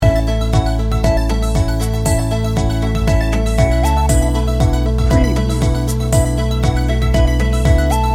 motivating and inspirational loop, electronic percussion